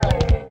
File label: yeti hit